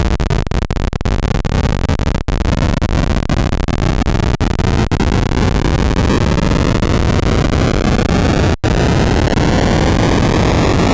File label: noise sweeps, pokey